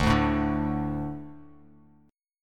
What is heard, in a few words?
D 5th D, down, Bass Standard; D5 strummed; JGuitar